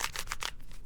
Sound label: Big Cube - Twist, Rubik's Cube